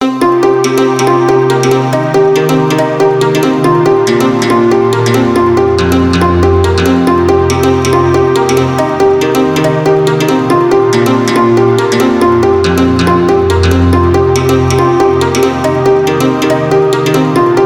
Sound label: dulcimer soft; rdgaudio